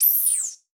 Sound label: Flash Wipe Electronic